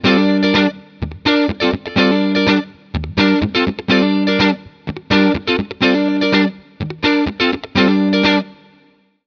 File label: Strat Hot - Neck - Clean, pickups; BYO Pickups